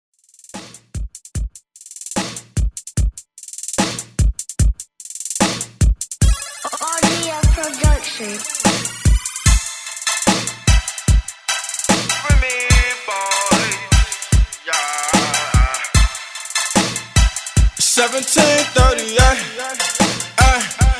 ReDrum 74Bpm; DJDOLLS

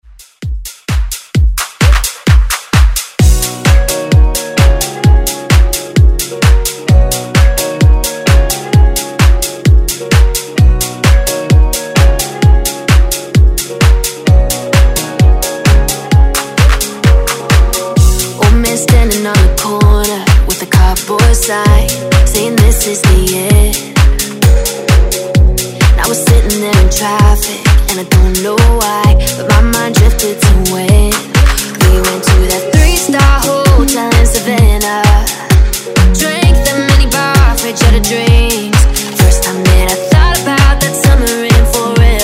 Dance

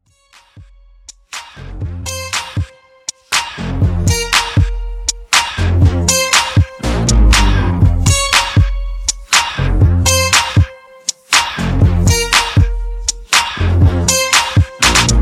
Intro 120Bpm

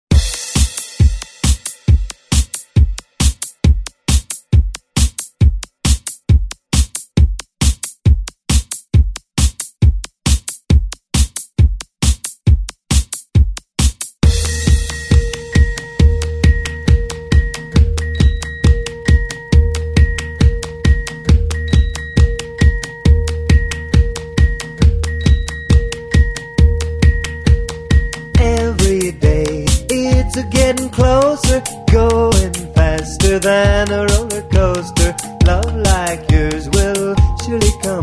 50s

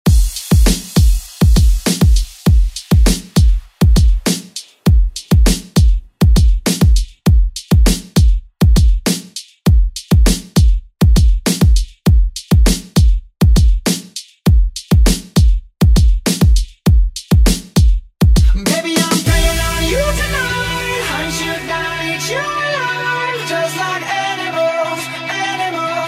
Pop Redrum; Deejay Hit

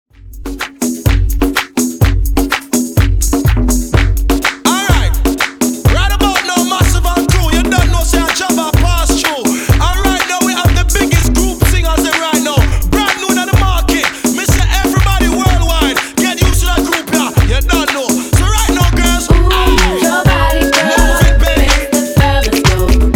128Bpm

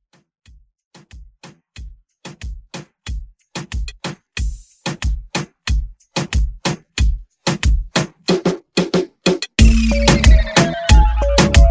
Reggaeton Redrum

